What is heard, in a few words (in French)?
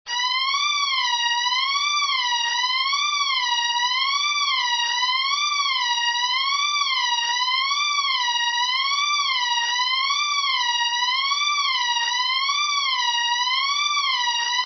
sirene